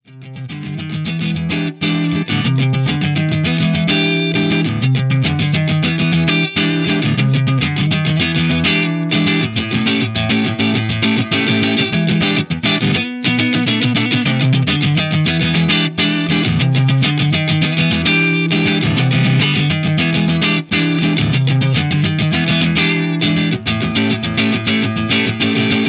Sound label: JTM45 Input 1 Hi Strat Neck Greens